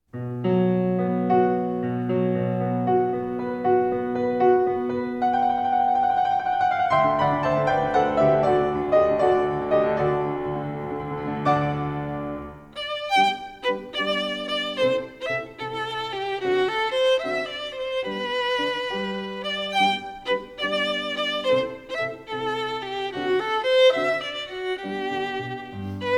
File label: Violin Concerto No. 2 in G Major, Op. 13 III. Allegretto; suzuki violin school